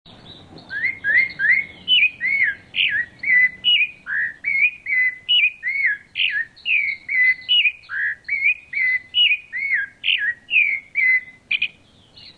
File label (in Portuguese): laranjeira, cantos sabias; popi